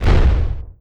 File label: Explosion